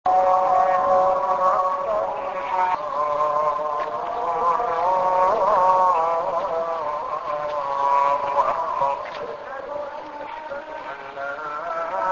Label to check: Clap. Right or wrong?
Wrong